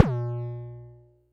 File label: digital tonal, Kickbank; Ableton Foundation FreePacks